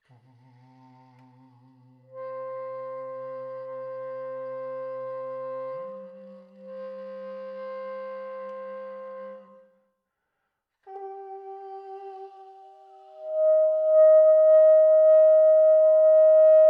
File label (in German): sfx sop, saxophon spieltechniken; Slap